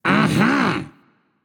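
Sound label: nonverbal agree; smite; ratatoskr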